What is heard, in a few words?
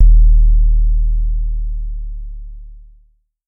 Pollard Syndrum Sub